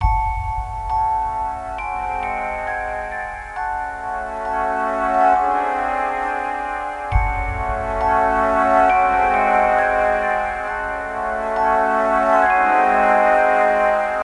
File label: Imperial Battle; Lego Battles 2009 NDS